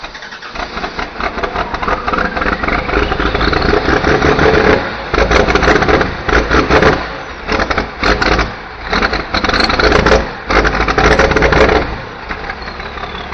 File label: Cummins cold start